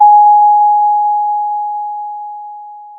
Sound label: Sound of a doorbell